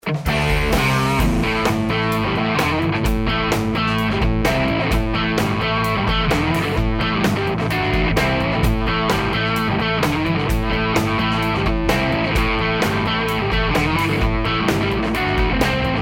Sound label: higain bridge neck, Tele; schwarzbach; Tele60s